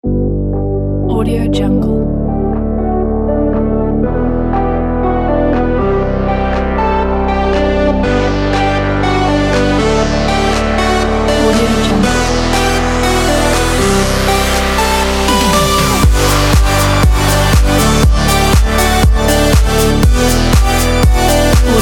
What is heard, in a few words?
inspiring pop